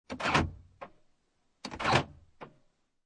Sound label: Transportation Automobiles, Car Interior, 1996 Acura Integra, Door Lock And Unlock, Automatic, No Engine